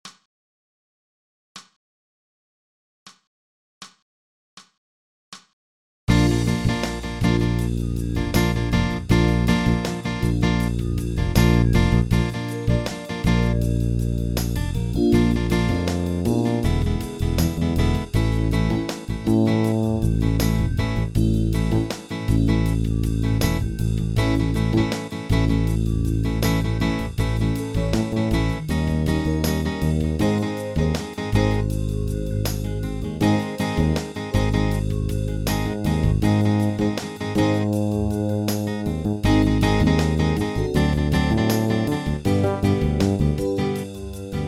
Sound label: Additive Slurs